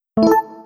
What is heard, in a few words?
suggestions Opened; NVDA; NVDA Ericson Sound Theme